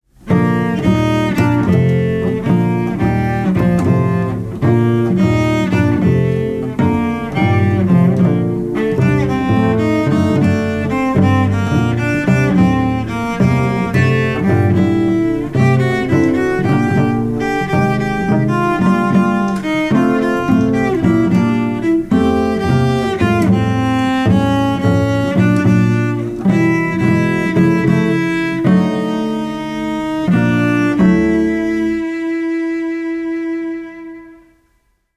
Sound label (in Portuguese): Violicelo